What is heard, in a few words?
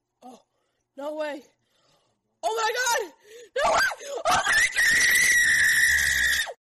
oh my god girl screaming